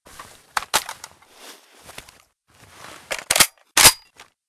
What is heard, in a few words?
fal reload; 762mm FAL; weapons assault rifles